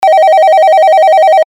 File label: synth telephone ring